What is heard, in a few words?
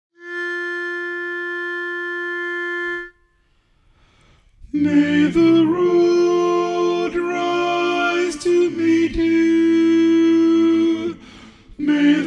Irish Blessing - Tenor Loudest